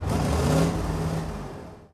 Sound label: engines; World of Tanks